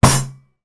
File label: impact fist on table with cutlery on; sound effects one